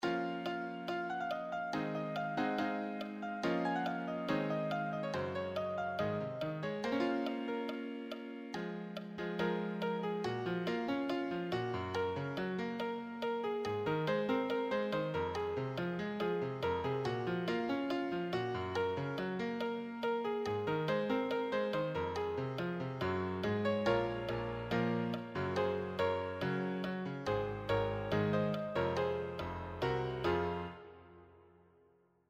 advanced solo, piano

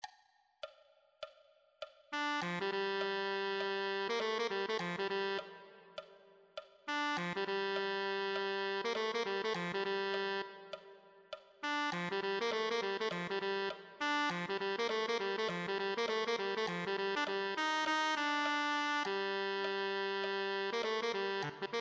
tenor saxophone

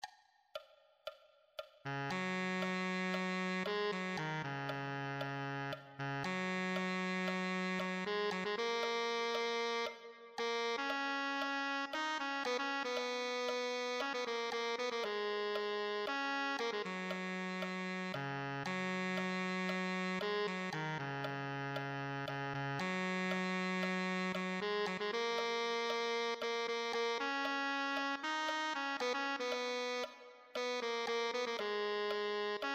tenor saxophone

